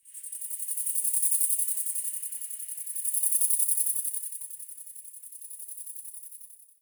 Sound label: Insect Day